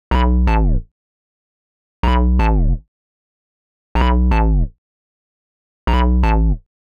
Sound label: Hard Synth Bass E, 125; PowerFX - Nu Jazz House